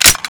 Mp44 clip in

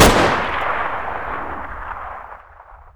thompson shoot

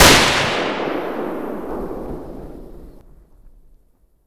k98 shoot, weapons

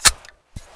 Mp44 clip in

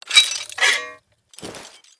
rocket clip in

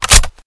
bar bolt forward

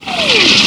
arty incoming; WeaponFire

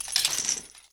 mg42 bullet chain, weapons

